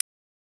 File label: Burst